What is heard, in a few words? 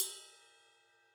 Ride, cymbal; SAA Rock 20; apulSoft apTrigga2; Multi-Velocity Cymbal Samples